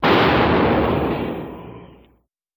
Metal Crash; crashes

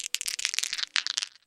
Dice Throw Around

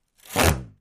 Rip Rubbery Quick, plastic

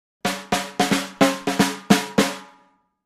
Drums Snare Accent

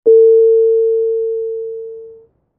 Common Airplane Flight Attendant Call

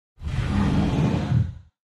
Space Door Close Sli, doors high tech

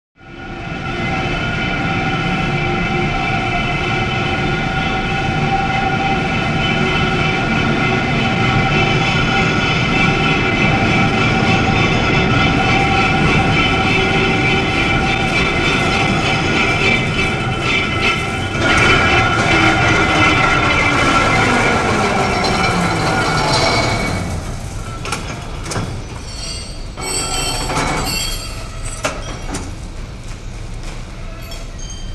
Overhead Crane Up, cranes

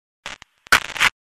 Static Bad Connect, communications

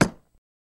Knife Impact Wood Metal

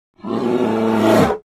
Bear Grizzly Growl

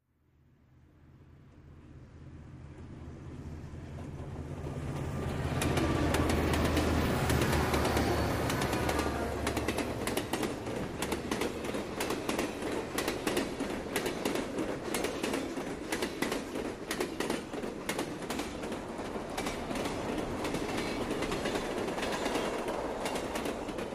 Train Fast By Med Spee, bys

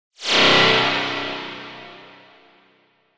Burst Elect Wash Trem, bursts

